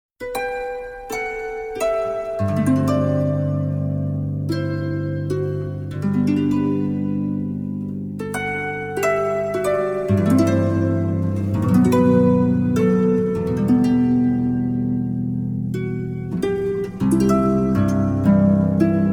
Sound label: harp